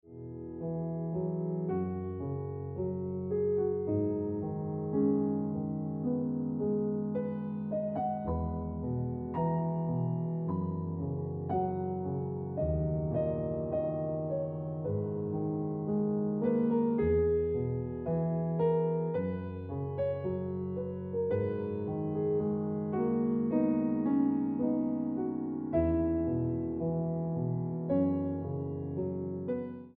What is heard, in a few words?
Ringtone